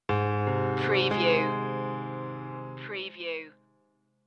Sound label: melancholic flute stinger